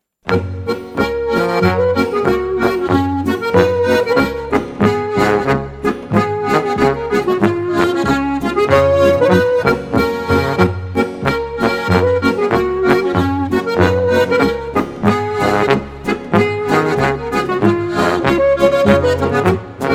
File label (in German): ziach schlitten boarischer